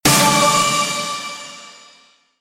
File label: word success pinch; typing; tf2ware ultimate